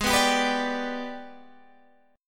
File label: Ab Minor Major 7th Flat 5th, down, Guitar, Standard; AbmM7b5; strummed